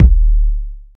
round kick drum one shot d key, kickdrum sample tuned to the D note